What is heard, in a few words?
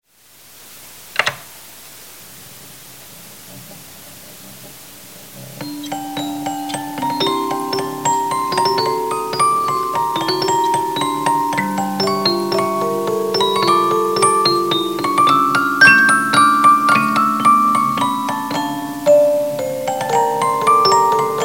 menuet